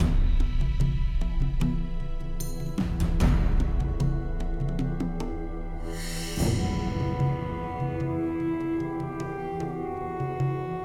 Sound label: Cinematic Neutral; Shadow Guardian; android ios gamerip 2010